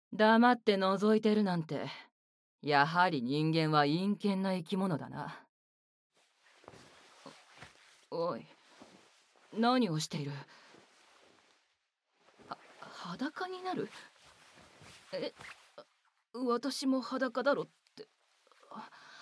汗だく耳舐め乳首舐めながら手コキ; ベッドで密着開始…奴隷は結局奴隷！

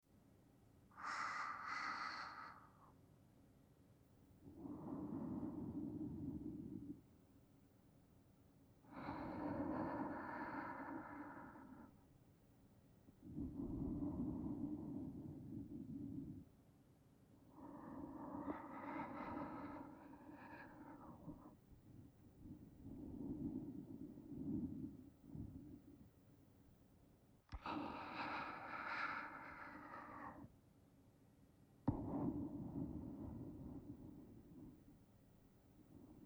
寝息ループ; ゆめぐり; ループトラック

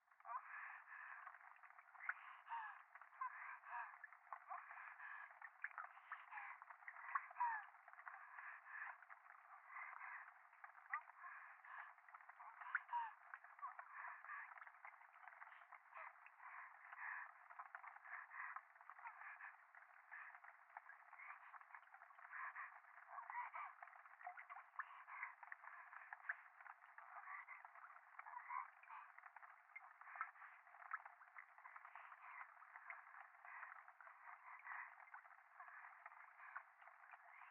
右耳 密着 処女 喘ぎ 雨上がり

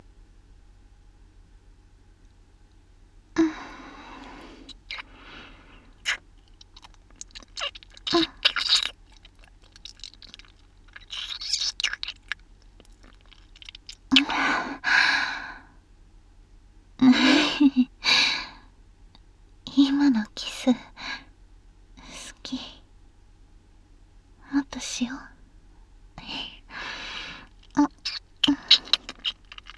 耳舐め＆フェラチオ; 口内射精♪そのままごっくん

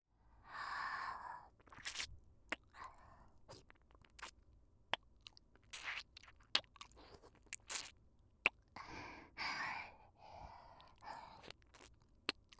耳舐め, ASMR; SEなし

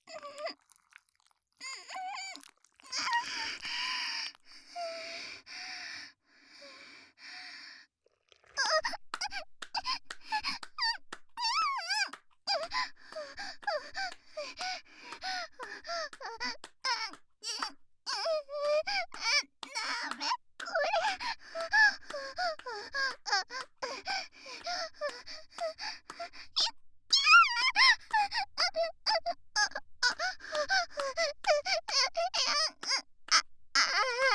挿入 喘ぎ; SEあり

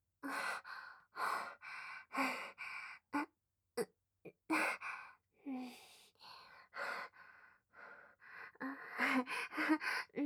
喘ぎ声 近め

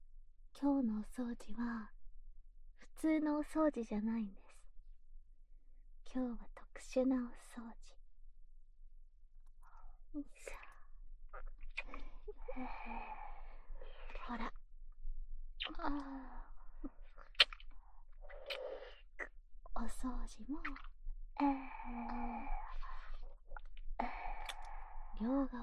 ダブル お掃除フェラ, ASMR

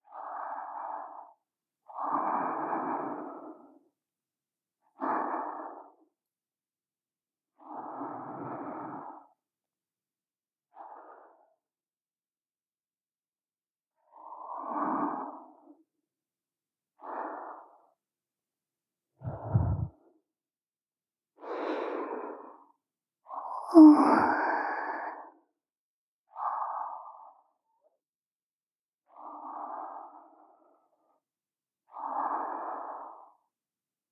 妹の寝息(右), ASMR; ループ

